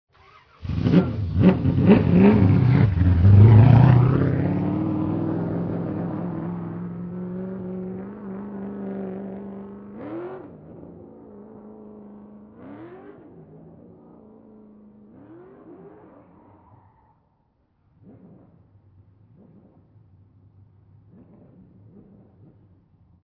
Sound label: moto